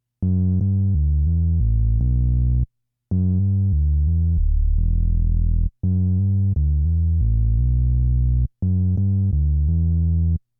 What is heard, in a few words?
woody bass synth